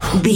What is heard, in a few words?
voice male breath quick